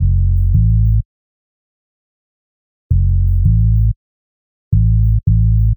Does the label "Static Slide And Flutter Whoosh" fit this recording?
No